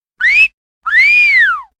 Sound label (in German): hinterherpfeifen